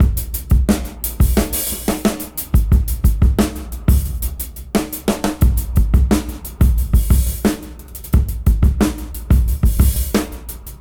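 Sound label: Fat Break 89 BPM Reverse; AMG - Keith LeBlanc Phat Butt Jams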